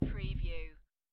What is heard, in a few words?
Dice Throw One Die On Felt